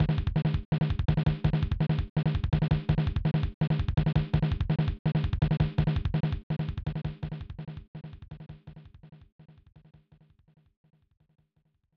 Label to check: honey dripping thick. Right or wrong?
Wrong